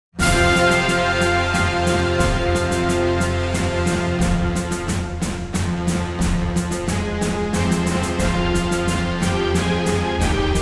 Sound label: brass minus